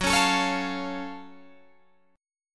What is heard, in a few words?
F sharp Minor Major 7th F sharp Guitar Open G